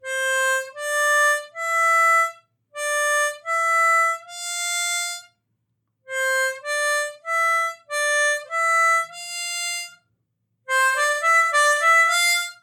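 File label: Triplets Ascending, harmonica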